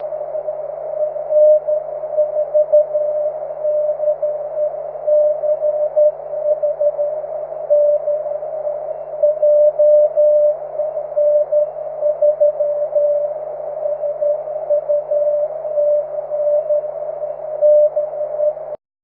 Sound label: cw; 23cm